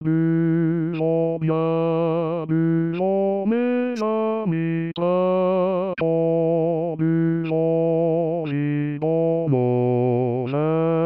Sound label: Tourdion bass; Anony